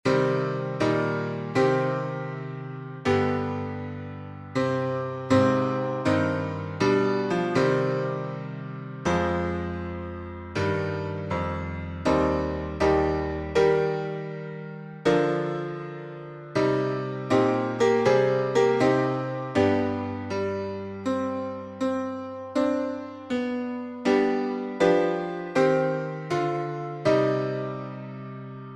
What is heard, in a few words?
piano synth; Little Free Music Library